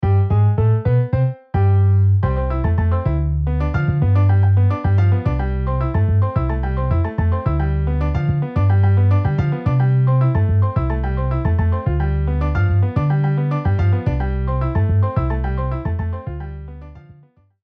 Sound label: Piano Bass MIDI